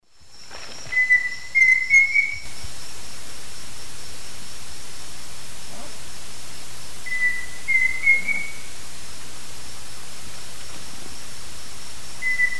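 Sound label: Dromococcyx phasianellus, song variant playback; S. America; Eastern Lowlands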